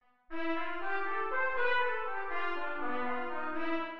Maj7 inv0 en C

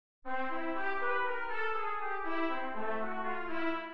Maj7 inv0 en C

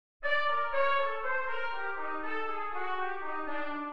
Min7 en C

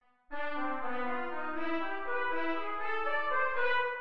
Maj7 en C; Las Flores

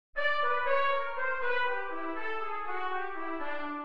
Maj7 en C; La esperanza

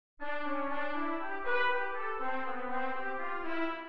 Maj7 inv0 en C

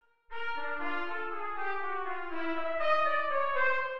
Dominante inv0 en C

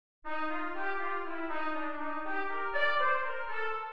Min7 en C

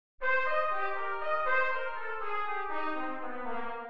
Min7 inv0 en C

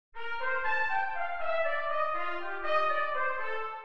Min7 en C

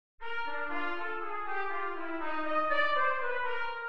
Min7 en C

